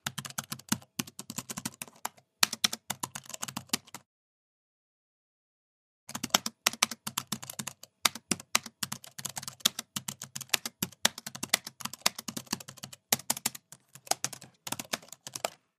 Computer Laptop Keys, computers

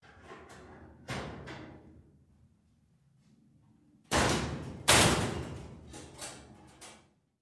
Locker Slam Mediu

